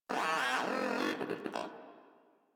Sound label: Moa Aggressive Vocal Bored Lets Go, Pets; Warframe